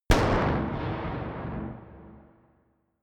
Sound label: gun dark pop gun; schusswaffen